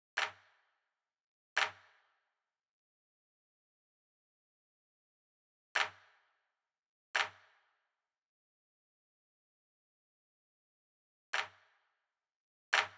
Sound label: CLAP